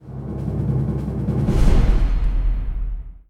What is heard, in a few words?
rank lower; tetrio